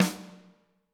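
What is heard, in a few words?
snare drum, Drums; Bob Clearmountain Drums I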